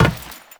step metal, footsteps; Warframe; Teshin

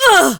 Pain, Barks; Kuva Lich; Persona B

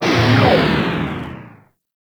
Proj Large Fire; Warframe; Lizzie

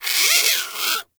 Forest Rodent Vocal Angry Short; Vocals; Warframe; Characters

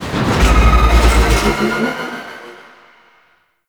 Elevator Start; Warframe; Ambience; Infested; Golem Trial

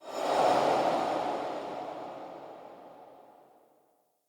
Museum Pedestal Light Whoosh; Ambience; Vitruvian Museum

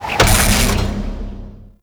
Weld Finish; RailJack Hazards

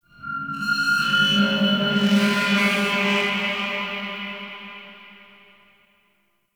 Modular Texture, Ambience

